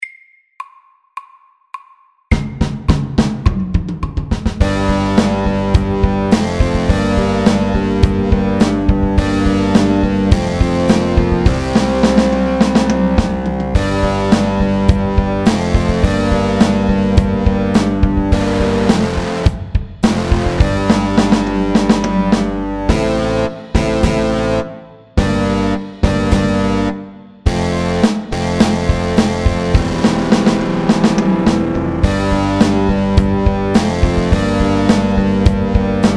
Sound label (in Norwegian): Heavy metal MIDDELS